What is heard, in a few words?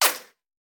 Bullet Ricochet; Sound Ideas; Guns